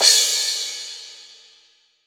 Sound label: crash cymbal, mono